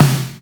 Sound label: snares; Vengeance Samples